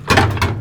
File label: klonk